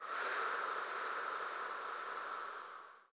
CHO, Women-Combi; Sound & Vision; Gigapack I CD 2; CHO Mixed

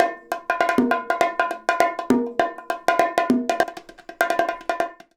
BONG, BONGO 100, Modern Percussion Loops

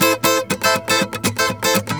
GUITAR SALSA

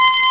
Spaceship Launched; Math Blaster - Episode One

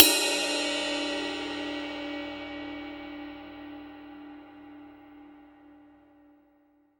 RIDE, Drum Dry; East Collexion; Drum 1 Dry

